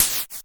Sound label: NOIZ, Dual Percussion Synthesiser; Klone